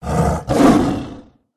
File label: tiger attack voice middle